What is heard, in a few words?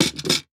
Plate Put Down, Household